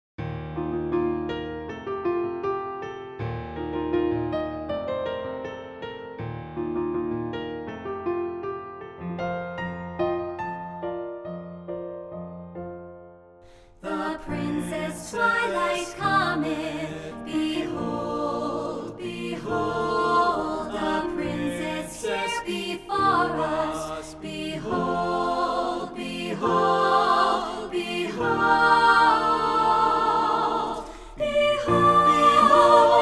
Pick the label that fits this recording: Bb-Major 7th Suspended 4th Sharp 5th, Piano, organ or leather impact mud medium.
Piano